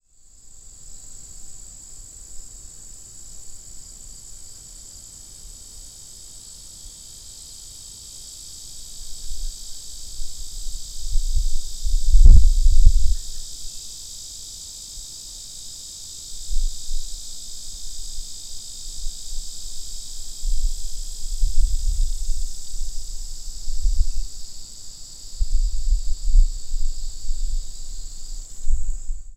Engelhardts Lyric Cicada; ZOOM

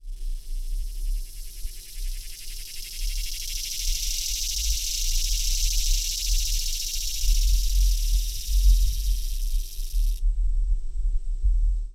Swamp Cicada

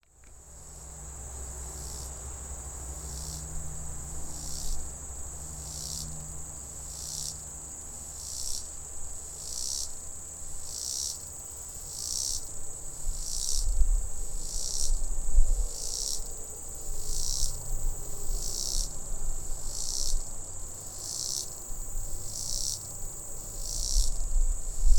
Robinsons Cicada

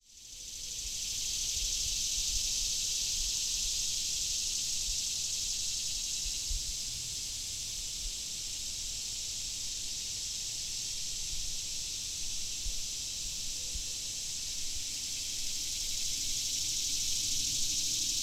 Swamp Cicada chorus; cicadas

